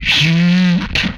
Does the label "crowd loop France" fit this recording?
No